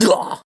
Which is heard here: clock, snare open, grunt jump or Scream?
Scream